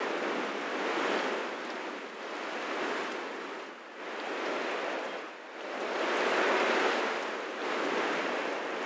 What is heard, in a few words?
SKID GRASS; YBR125